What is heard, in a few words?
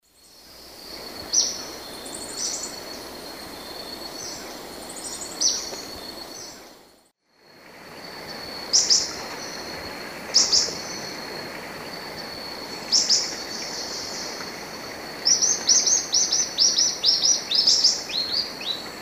Hylophylax naevius, terra firme, calls and duet; S. America; Eastern Lowlands